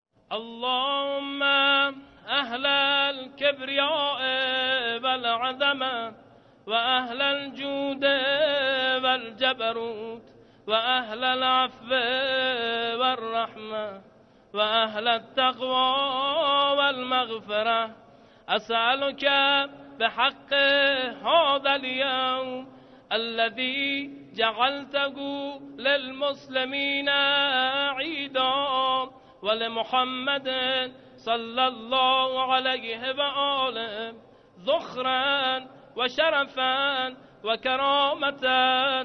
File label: qunut, قنوت نماز عید فطر